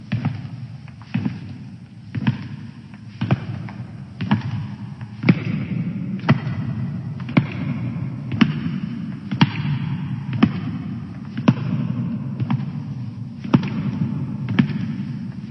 echo step

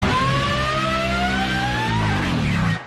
metal shout; spongebob

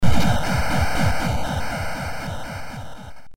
explosion; contra

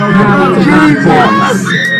audience reaction; martial arts mayhem scene

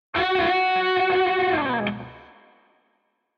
sample alerts; twitch

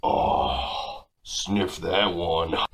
sniff

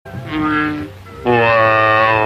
weep wow

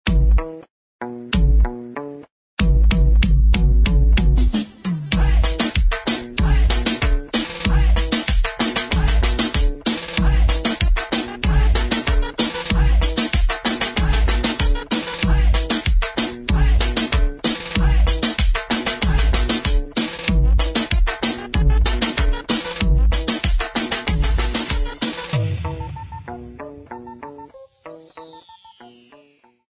dembow

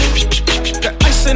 pew pew pew

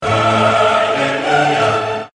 hallelujah

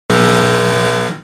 red buzzer

